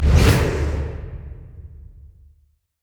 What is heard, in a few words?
summonerspell base ghost; LoL